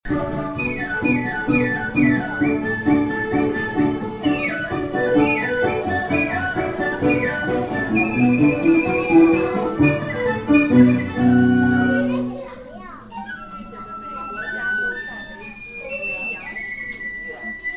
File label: TRAD MUSIC